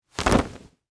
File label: Short Sleeve Unsnap, Foley, Clothes, Short, Sleeve, Shirt, Unsnap, All, Buttons, At, Once; Dressing; BlastWave